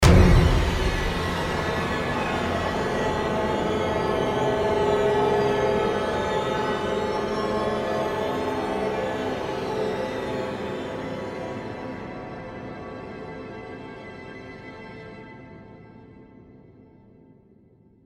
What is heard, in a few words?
Stingers - Tension; Planet Alpha